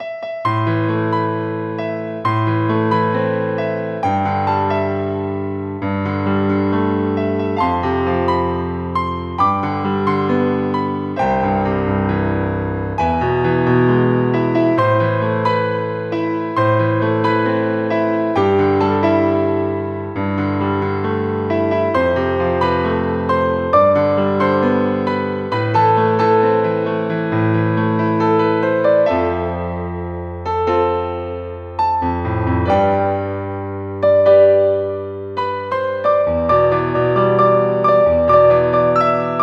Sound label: Piano